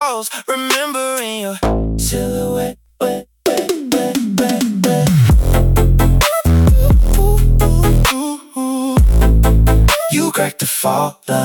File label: hook mixed